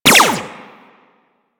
Burst Laser Pistol Fire; DE; Warframe; Weapons; Sentinel

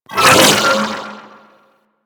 Amalgam Override Vocal Pain; Warframe

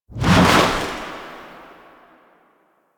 Jump Whoosh; Kela De Thaym; Boss Intro

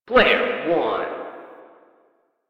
Arcade Voices; Warframe; Ambience; Nineteen Ninety Nine

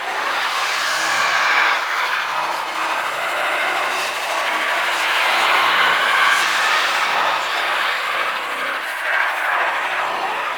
Stalker Spark Grenade Loop; Warframe; Sentient Stalker

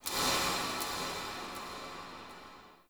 Hub Texture L, Ambience, Dungeons, Under City; Silent Rose

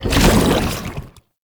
Goo Layer, Footsteps; Enemies; Foley; Warframe; Lasrian Soldiers; Lasrian Chem Strike Nox

